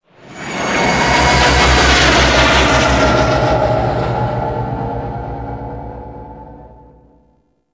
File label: Fly By Slow; Vehicles; Warframe; Liset; Nora Liset